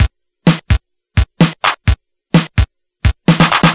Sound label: Game Boy Break Loop, 8-Bit, Drums